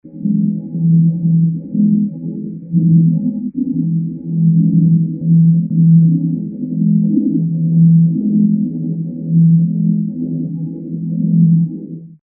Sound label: harmonic event